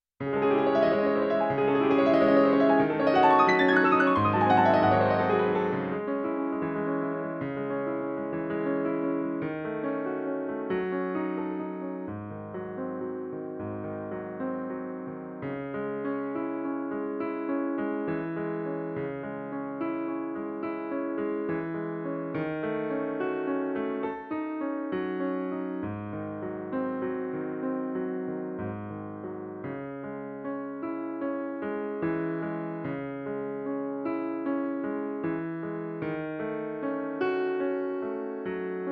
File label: Impromptu Music